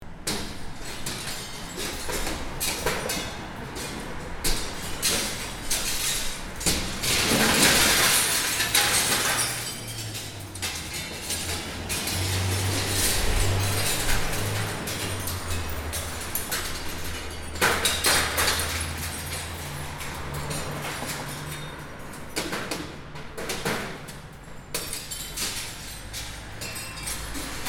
Vetri in frantumi

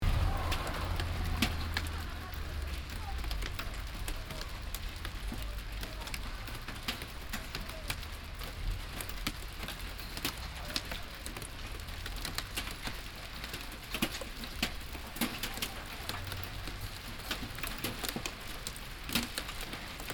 Grandinata

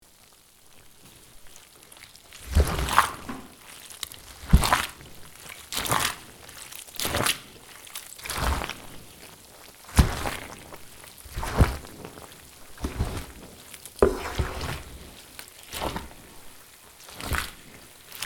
Rottura del cappello delle vinacce

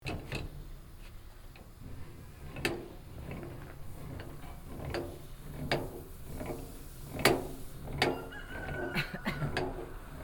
Paiolo

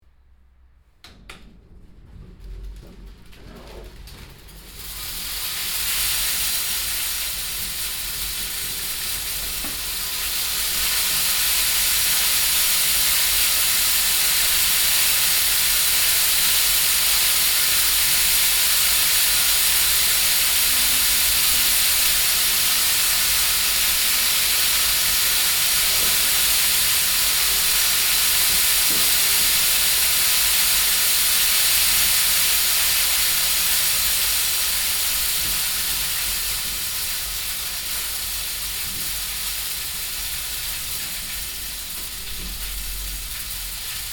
Chicchi

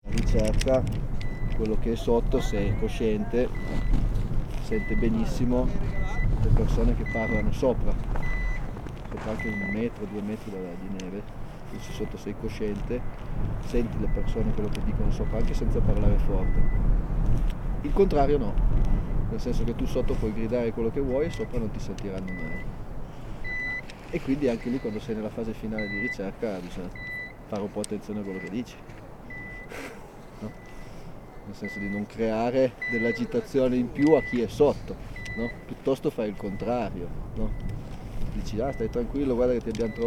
Soccorso alpino

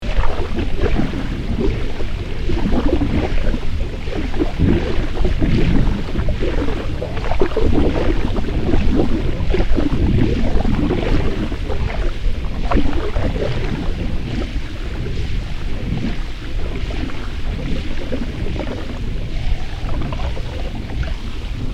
Nel canale

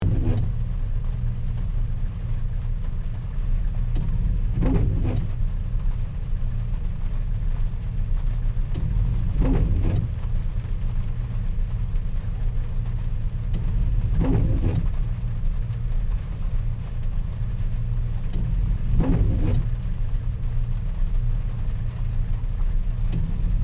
Tergicristalli

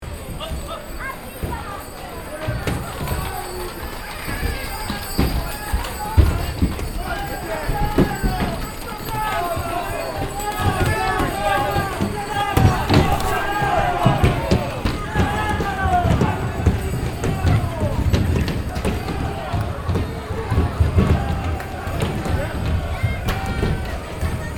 Arance

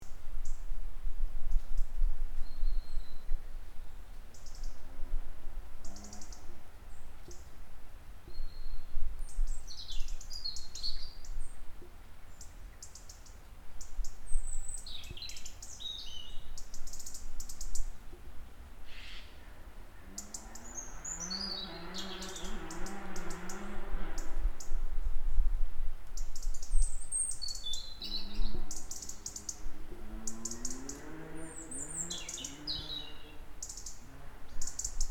Moto in Lontananza

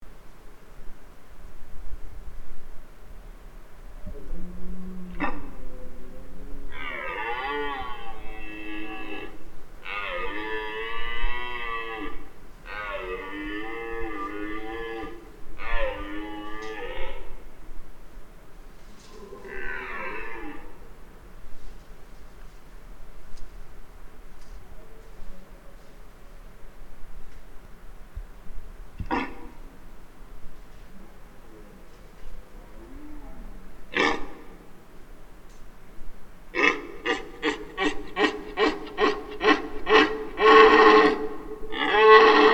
Il bramito del cervo

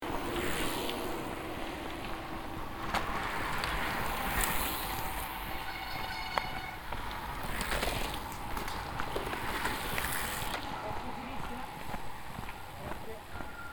In discesa

